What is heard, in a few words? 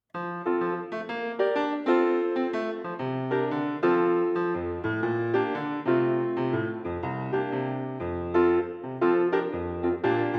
accompanyment only; no. 2 from globetrotters